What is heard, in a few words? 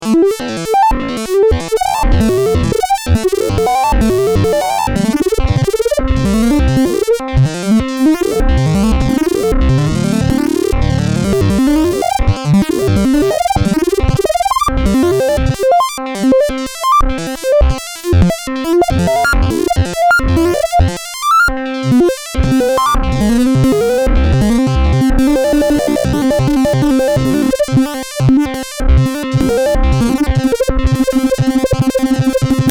waldorf pulse